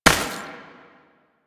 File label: Step Dirt, Foley, Footsteps; Enemies; Warframe; Duviri; Dax